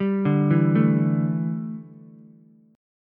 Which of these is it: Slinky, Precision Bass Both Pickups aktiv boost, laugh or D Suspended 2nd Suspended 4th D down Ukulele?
D Suspended 2nd Suspended 4th D down Ukulele